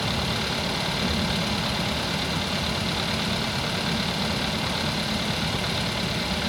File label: Car Idling Loop